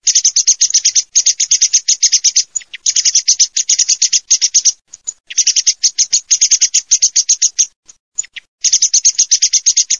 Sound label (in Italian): SCHIAMAZZO